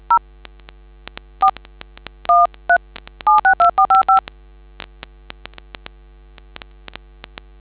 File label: dtmf